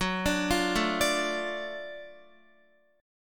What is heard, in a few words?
Gb Minor Major 7th Sharp 5th Gb down Guitar; arpeggiated